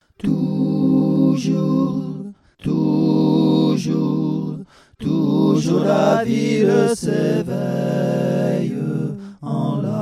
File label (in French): tutti voix